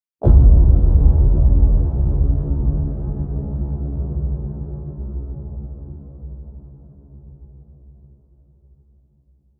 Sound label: Hit Deep; Ninja Tracks